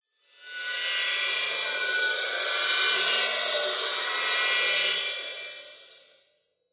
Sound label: Metal Scrape, Production Element, Imaging Element, Accent, Transition; Warped